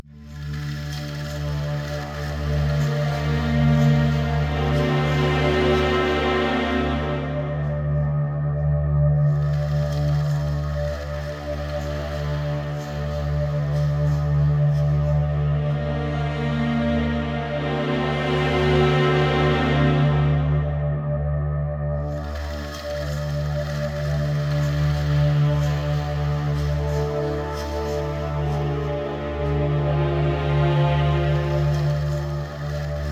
bgm interval

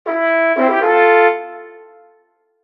combat win; heretic operative

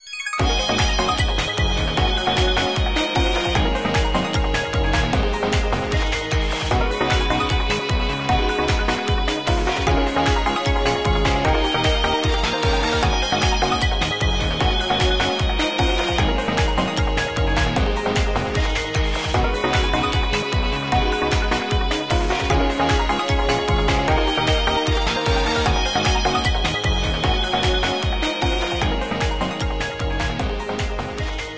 bgm treasure; Dragons Colonies; android

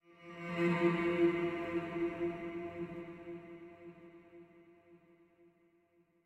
Jingles VINES OPEN; Semblance

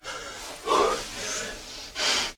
Prisoner Catch Breath; Prison Architect

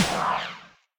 Gameover; Dash till Puff; android

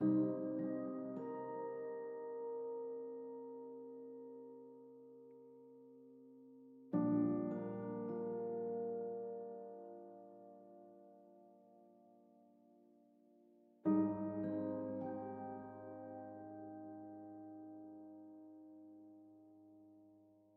theme menu

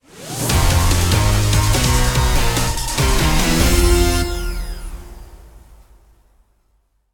Music Timed Event Ditty; Worms Rumble; PS4 PS5 Switch Windows Xbox One Xbox Series XS

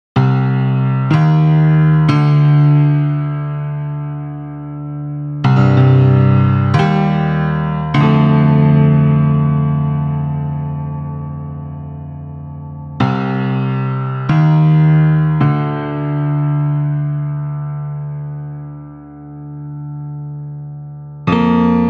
Music Death Menu restart; Metal Tales Fury of the Guitar Gods

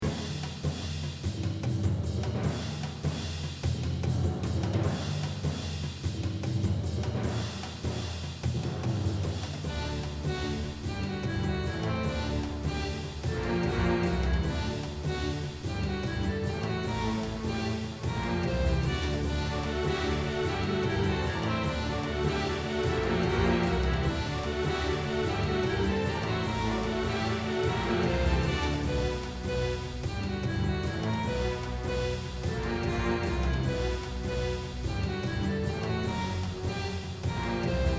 Boss Battle; A Druids Duel original soundtrack 2015

